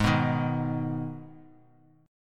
G sharp Minor G sharp down Bass Standard, chord; G#m strummed; JGuitar

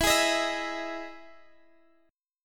E Major 7th E down Guitar Standard; EM7; strummed; capo=2

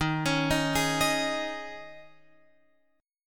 D sharp 7th Suspended 4th, Guitar, Open F; D#7sus4; arpeggiated

